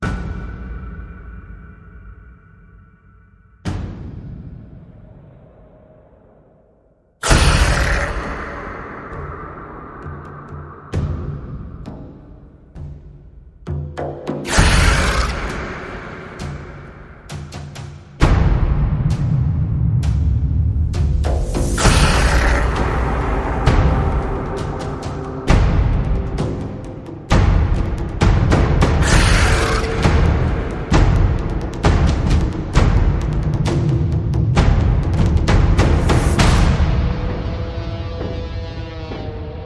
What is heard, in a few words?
Percussion and FX